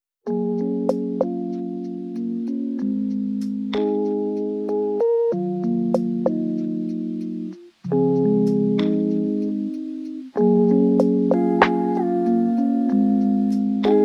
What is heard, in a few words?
lofi hip hop, beats to relax study